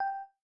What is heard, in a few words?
Video Record, ui; OnePlus 5T Stock Ringtones